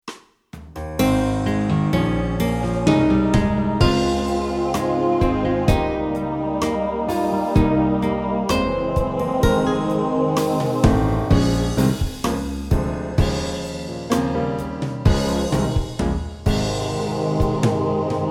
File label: soft gospel